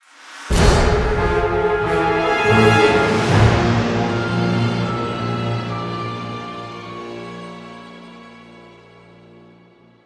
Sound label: Boss Lose